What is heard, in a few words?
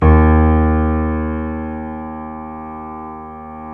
KEY, YC7; Roland L-CD701